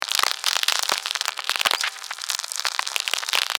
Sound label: Cloud Noise